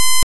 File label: shoot, attack sound; カタナ１